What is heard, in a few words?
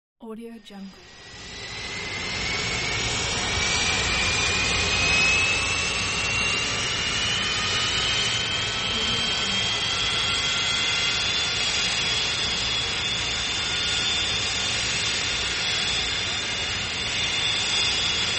airplane taxi